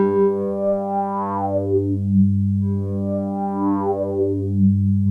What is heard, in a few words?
OBERHEIM, OLD SYNTHS; Rubber Chicken Software Sample Bank